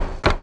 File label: Button Push; Mike Koenig